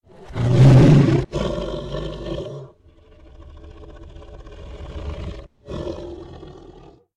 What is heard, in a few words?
Tiger roar reversed; SyrinScape